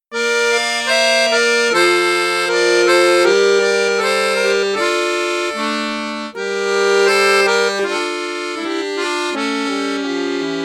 registre flute juste, accordeon; cavagnolo professionnel 12

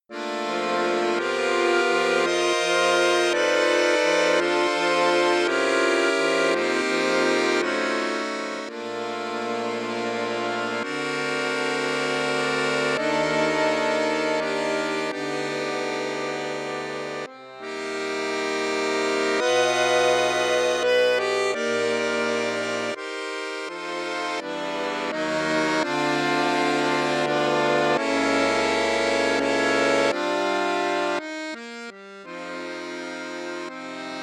registre basson flute juste, accordéon; piermaria 312

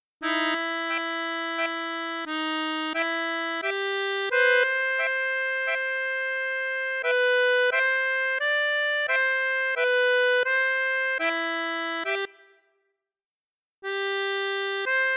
registre flute juste, accordeon; piermaria 312

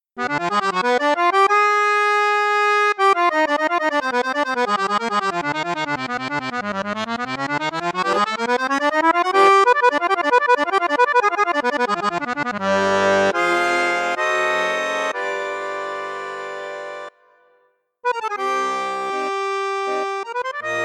registre basson flute juste, hohner morino artiste accordeon; hohner morino artiste vi d

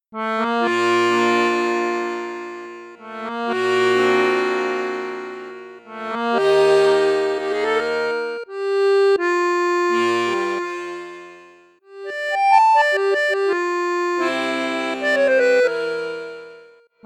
registre gros basson, accordeon; cavagnolo vedette; cavagnolo vedette 5

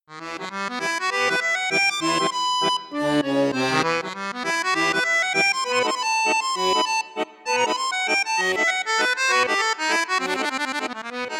registre flute juste, accordeon; savoia cassotto ii

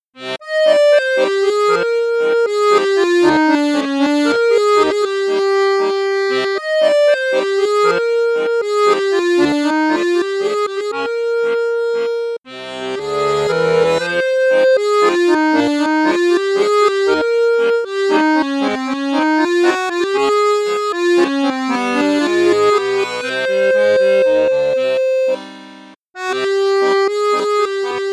registre basson1 basson2, accordeon; cavagnolo vedette 10

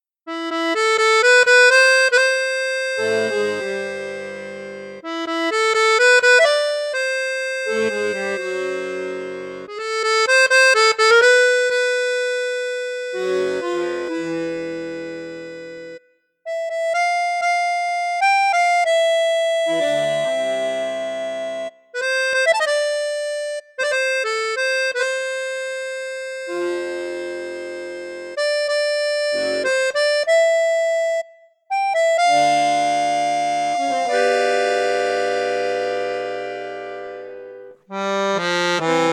registre basson, accordeon; mengascini mini retro

